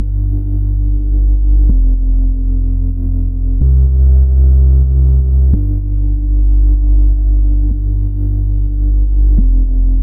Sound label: Bpm125 G Decimate Bass